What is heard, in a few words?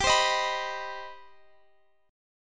C Minor Major 7th G